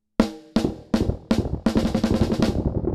Zen Delay TIME MODULATION FREQ 20.00 Hz LEVEL 100.0